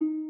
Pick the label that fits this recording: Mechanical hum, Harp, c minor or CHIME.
Harp